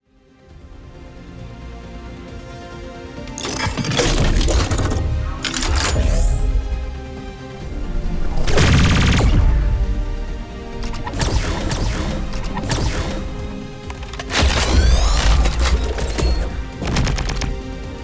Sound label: sound design sci fi weapon